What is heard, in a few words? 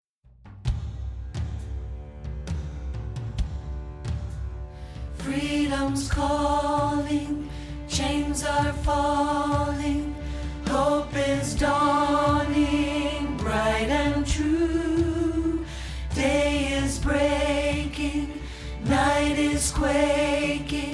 alto rehearsal; choir